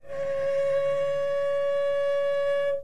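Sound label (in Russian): печальный музыкальный звук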